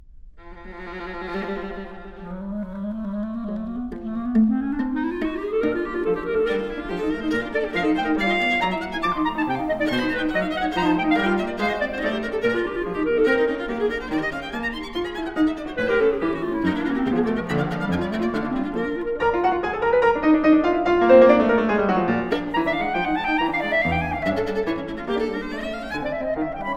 Trio for Clarinet, Viola, and Piano - Allegrissimo; Bruch Trio